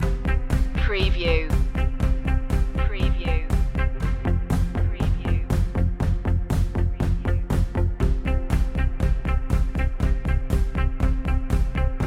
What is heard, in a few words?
champions forever no piano